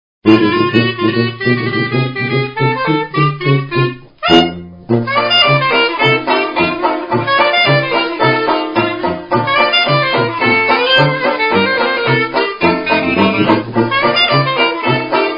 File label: blues